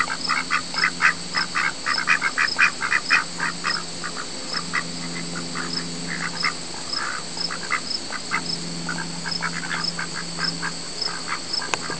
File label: boy quack